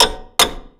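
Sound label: system clock; Etrian Odyssey I HD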